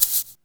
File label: SYN SHAKER; Back In Time Records - Big Bang